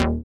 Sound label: SYN OLD SY, dance synth; Sound & Vision - Gigapack I CD 2 (Roland)